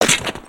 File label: Skullken Walk Run; creatures organic